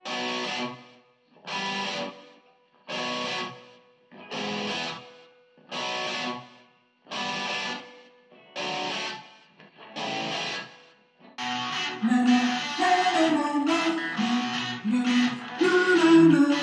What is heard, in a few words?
Electric guitar Harp Vocals